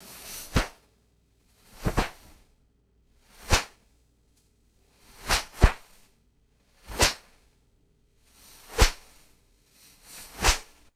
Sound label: sharp cloth whipping and snapping